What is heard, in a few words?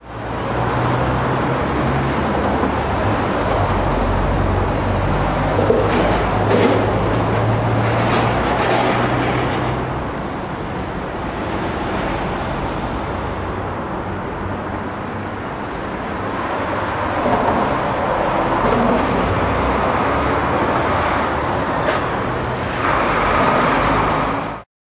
Golden gate bridge Traffic; Google Earth VR